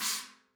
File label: China Choke; ZKCC 17; apulSoft apTrigga2; Multi-Velocity Cymbal Samples